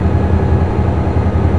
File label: Motor, Train; F40PH-2.6